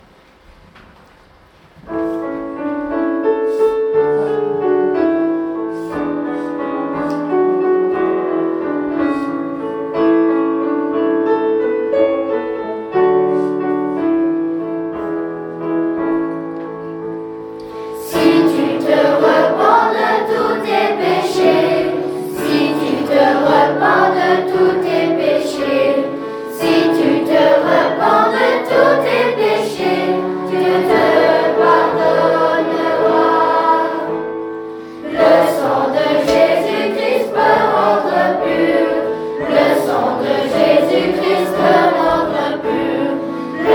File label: enfants; chants